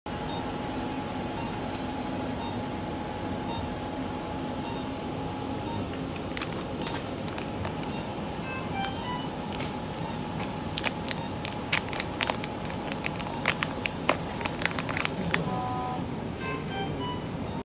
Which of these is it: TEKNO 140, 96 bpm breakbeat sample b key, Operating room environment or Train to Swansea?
Operating room environment